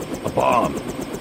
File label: bomb